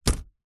foley basket hamper picnic set down on hard surface; zapsplat